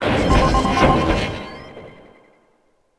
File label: Goodyhut Pickup; Ambient; Sid Meier's Civilization 4 Complete; Beyond the Sword